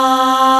VOX C3; E-MU Formula 4000 Series Vol. 5; Protozoa; Proteus1 Instrmt

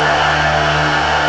PIPE RES; Ribulonic Vortex

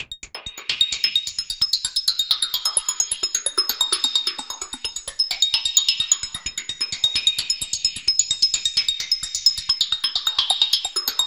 SYNTH LOOPS; Zero G; Malice In Wonderland

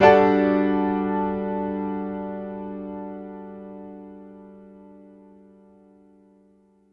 Piano stack (G) maj 7th; Sonic Foundry (Sony Creative Software); Ugly Remnants VOL-2

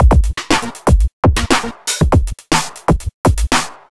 hardwerk 120bpm, downbeat dance grooves

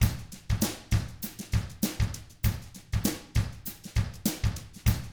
FUNKY, Drums

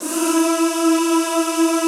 ZZZ E3, Classical Choir; Best Service; Extended Classical Choir

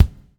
BUBL, BUBBLE; Spectrasonics - Backbeat

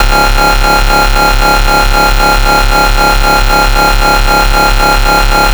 FS (C#1), FightSynth; neuro; Stanza; FightSynth Multi

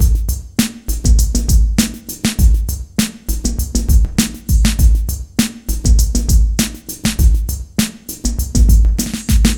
cheap beat 100, Dance loops

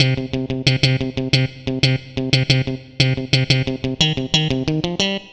Funky Guitar, Guitar Synths; Dr. Fink's Funk Factory

